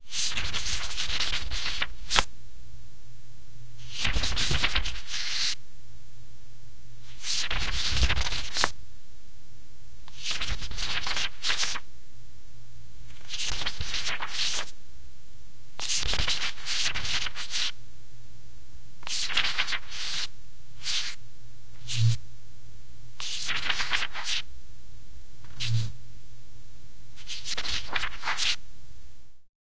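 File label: brush strokes flipping book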